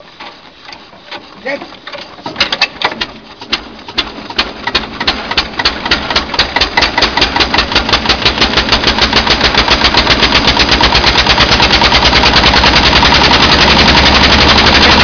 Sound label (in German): Traktor lang